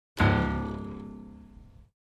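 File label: Grand Piano Strings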